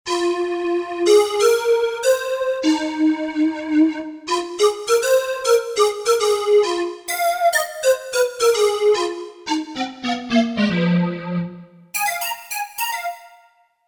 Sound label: Overblown Pan, Roland JV-880; Factory Patches